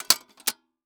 Technology Electronic Light 650 Watt Fresnel Light Barn Doors Closing